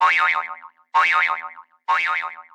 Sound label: Boing iPhone